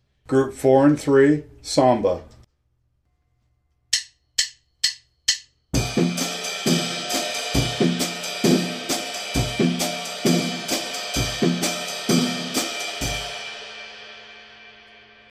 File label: samba